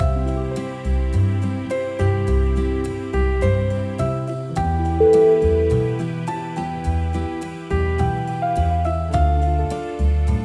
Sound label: magical bossa